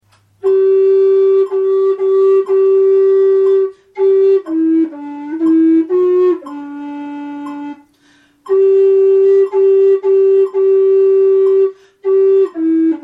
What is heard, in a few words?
Pavane G Em, FLUTE